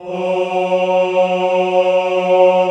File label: CHO F#2, CHO Men; Sound & Vision - Gigapack I CD 2 (Roland); Men-Combi